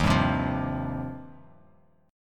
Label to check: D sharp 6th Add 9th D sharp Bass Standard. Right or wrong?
Right